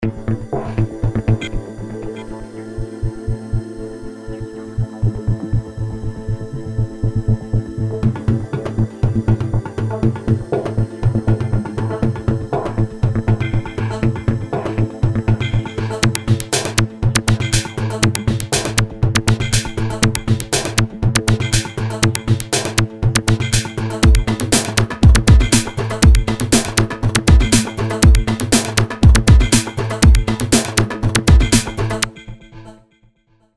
hyper seq

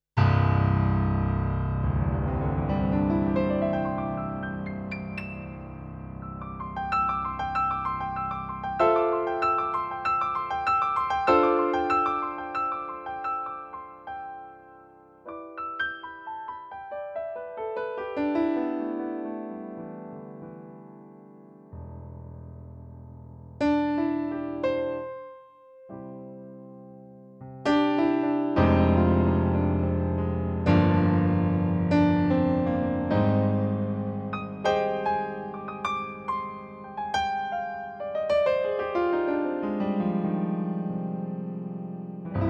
Kawai ES7 Concert Grand

